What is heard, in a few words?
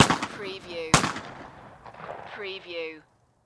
Shotgun Krieghoff; sound effects bible